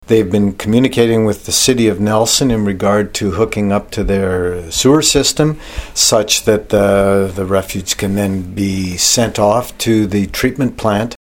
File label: Tom